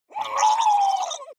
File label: water monster female gargle fear; koerpergeraeusche; comedy-cartoon; soundpool